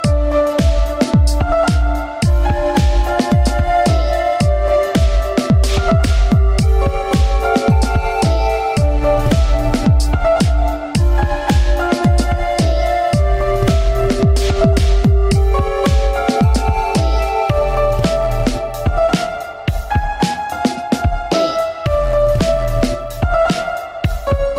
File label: piano song